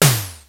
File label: Yamaha RX-5, drums perc; Rubber Chicken Software Sample Bank